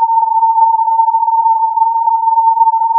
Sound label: filtered input